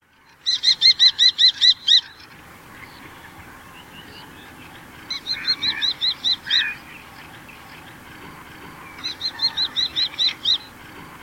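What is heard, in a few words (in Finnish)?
tuulihaukka, lintuäänet; Tero Linjama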